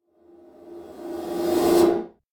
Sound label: Metallic Reverse Hit Impact - Metal Reverse; Hollywood Edge; sound designer's toolkit v2